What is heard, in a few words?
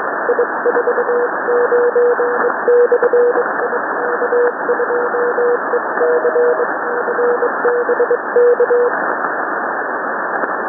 sending random prefixes